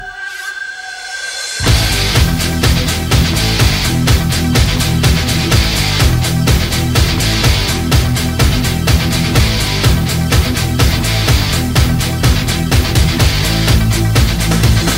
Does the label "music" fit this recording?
Yes